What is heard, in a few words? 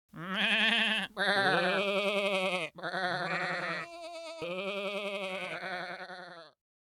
sheep decrescendo; emerald-crossing